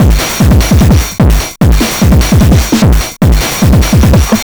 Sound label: MD 909 150, Hard & Loud Techno; USB Soundscan vol.01; AKAI